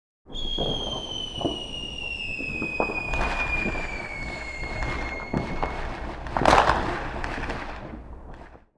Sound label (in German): silvester rakete; geraeusche digital